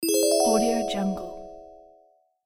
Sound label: magical surprise